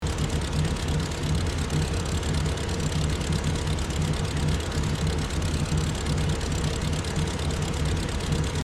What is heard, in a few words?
Old car engine idling seamlessly looping